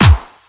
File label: high quality rap acoustic snare sound e key; snare drum samples